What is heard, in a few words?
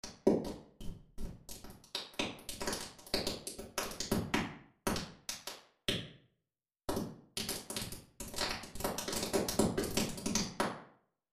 Drum Room; reverbs; waves trueverb